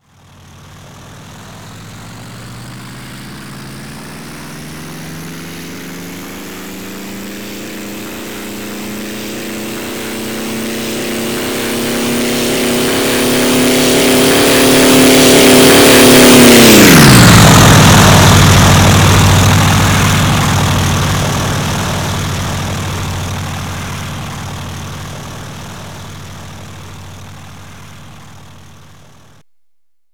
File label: Ha45 FLYBY; engine sounds